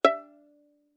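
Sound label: Violin pizzicato fortissimo on A string E5 stereo; MIS Pitches - 2014; Strings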